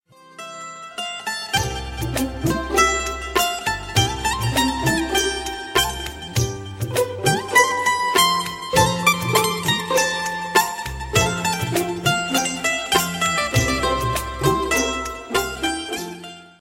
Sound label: Best Sitar Ever